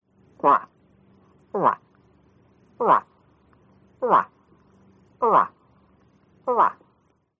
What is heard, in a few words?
Elk; audio hero